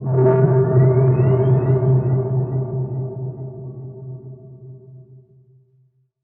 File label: Rising, Noises; Xfer Records Serum; Serum Presets